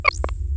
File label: glock